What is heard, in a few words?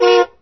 V8 Horn; 暴力赛车